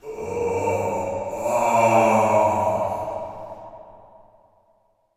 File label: prison pain; Quake 4 Alpha; id software